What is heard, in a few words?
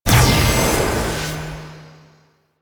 Healer Pulse; Warframe; Infested; Ancient; Healing Ancient